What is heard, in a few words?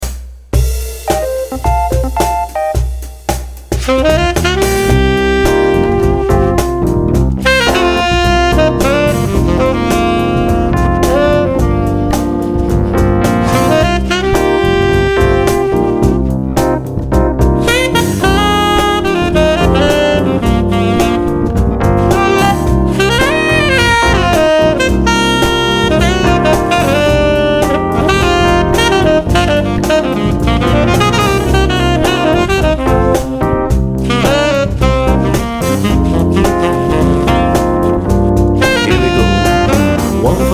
bass and sax